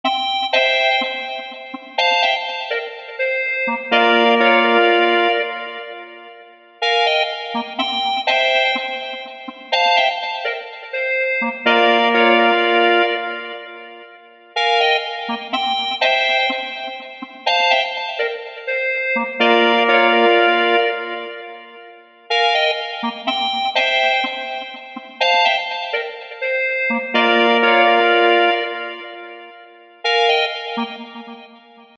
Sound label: lead; fire by music sound software